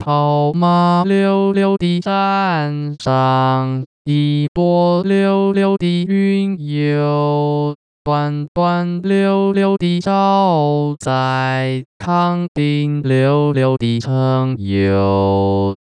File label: madrigal male, vibrato; KangDing